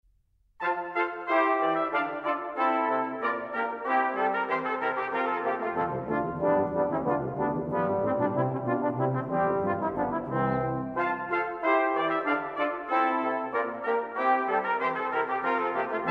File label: Suite For Brass